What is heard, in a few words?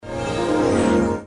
buff, battle